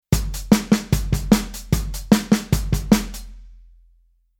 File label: Rhythm Rock n Roll